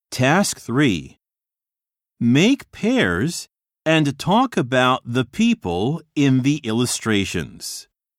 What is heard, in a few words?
harmony; 新課程英語教科書 指導用音声CD